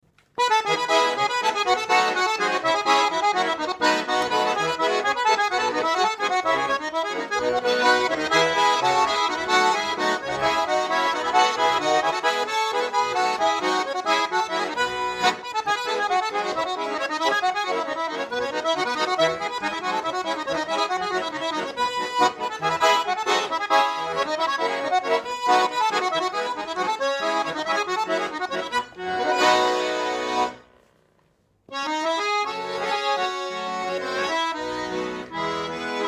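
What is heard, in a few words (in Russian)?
Баритон три тенора, гармоника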